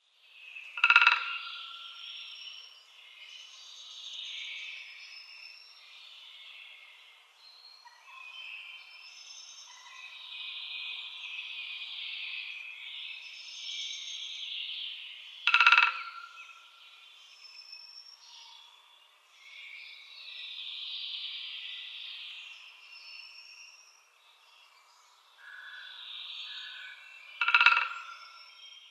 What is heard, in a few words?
Great Spotted Woodpecker drumming